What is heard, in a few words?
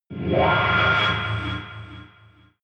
alien metallic scream dark reverb; aliens